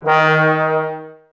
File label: BRS TBS D#, Woodwind Brass Ensemble, Brass; Miroslav Vitous - Woodwind Brass Ensemble